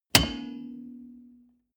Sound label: car bmw e46 interior seat lock in ring damped